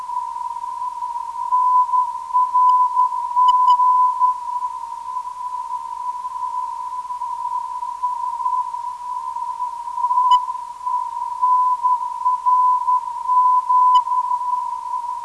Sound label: NRG 357kHz